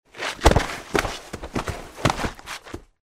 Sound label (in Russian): padaet i katitsja; Звуки падения человека